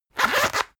zipper long open rapid; objekte; reissverschluss

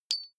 medium metal impact soft

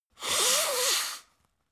nose blow; soundpool

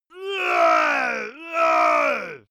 monster male growl aggressive; kreaturen

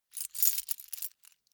key ring large rattle soft; objekte; schluessel

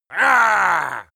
male attack pirat; schreie; menschen

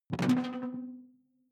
sci-fi alien bounce detuned; swooshes-swishes

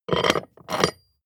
metal roll click ring

